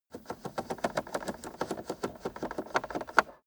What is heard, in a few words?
car temperature knob turn long slow